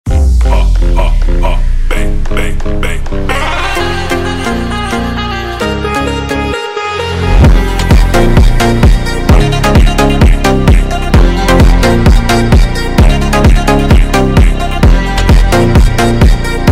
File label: Phonk